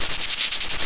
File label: flush